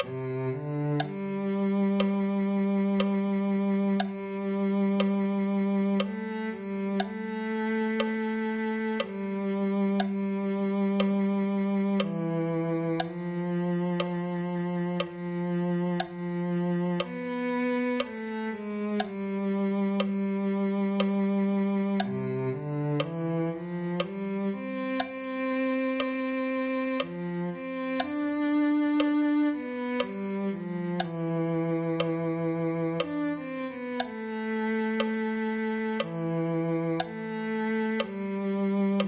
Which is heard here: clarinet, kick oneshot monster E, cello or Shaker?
cello